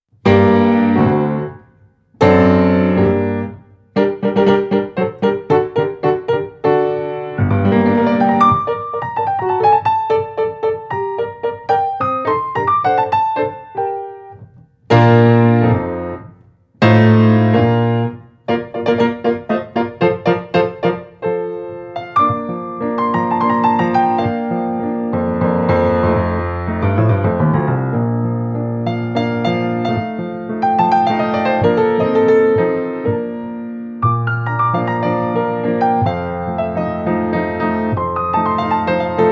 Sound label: Chopin Polonaise in g minor, piano